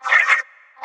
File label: Cat meow; super mombo quest